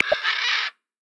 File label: Scarlet Macaw, Amazon; Xiaomi Redmi-Note-8-Pro